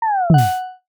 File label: calendar date confirm; buttons ui